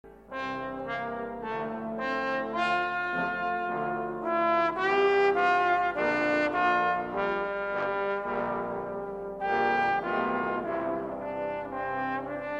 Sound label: Styrian Rock Ballad